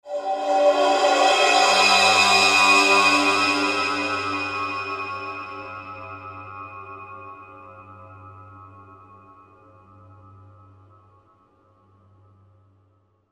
20 cymbal

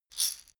shaker wood hit single soft

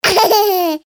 laughter short

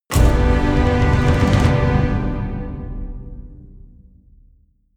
medieval jingle orchestral neutral

